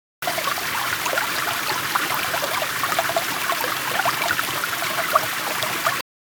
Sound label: water stream medium